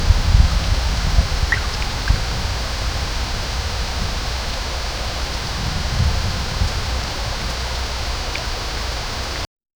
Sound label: gentle wind rustling, night; gentle wind rustling thro